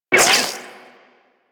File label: Shot, Auto Crossbow; Warframe; Tenno; Prime Ballistica